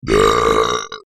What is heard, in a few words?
brap